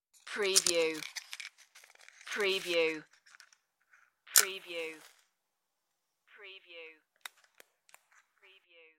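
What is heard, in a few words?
Match Book Flame Out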